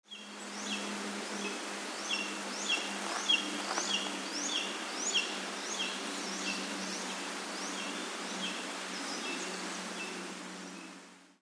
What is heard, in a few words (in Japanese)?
nosuri